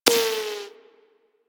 Bullet Impact Ricochet; Warframe; Weapons

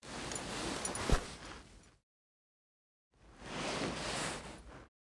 Foley Hand; Garren Heal Part Three; Deora; Garren Boss Arena

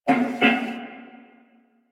Wolf Breath; Animals; DE; Warframe; Quests; Duviri

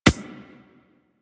Beach Ball Floof Bounce Dirt

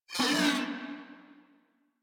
Moa Vocal Pain, Enemies; Warframe

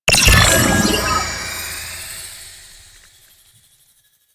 Pickup; UI; Pickups; Warframe

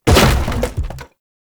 Crate Wood Medium Destroy; Objects; Destructable Wood Crates

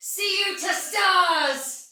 Pre Death Soldier Female, Battle; Dialog; Sinecure

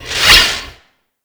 Space Pod Rocket By; Grineer; Vehicles; Warframe

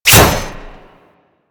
Radial Blast Impact Metal; Warframe; Excalibur

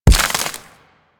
Nechro Mech Step Surface Foliage; Step Surfaces

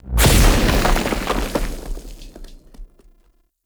Drunken Flame Pillar Burst; Characters; Enemy; Abilities; Circade

